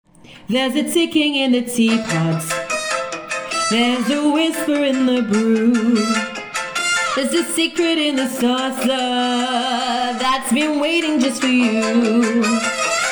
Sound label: Vocal; Hatter; VOCAL GUIDE